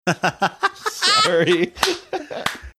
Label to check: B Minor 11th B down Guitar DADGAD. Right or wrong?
Wrong